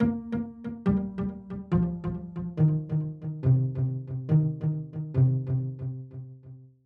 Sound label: Korg Polysix